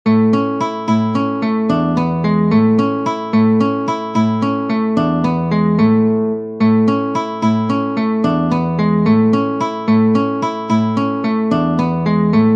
Walze, guitare

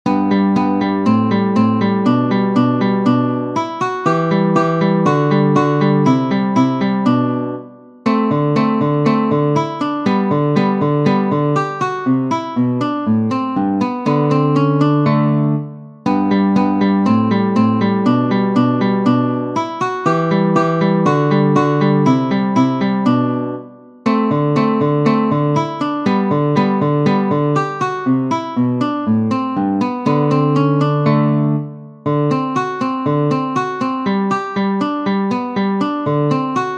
Andantino, guitare; Ferdinando Carulli; Op. 241 Méthode pour la guitare

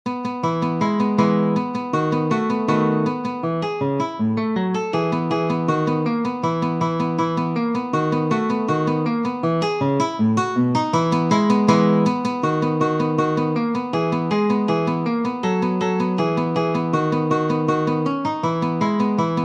Andante, guitare; Ferdinando Carulli; Op. 241 Méthode pour la guitare

